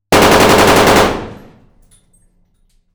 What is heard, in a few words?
AK47 catacombs burst blanks in front of gun wide stereo; Indoor Gun Acoustics; MKH8020; Pole Position; The Indoor Gun Acoustics Library